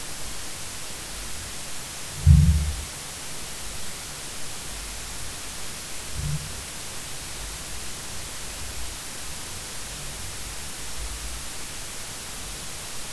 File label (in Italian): Passi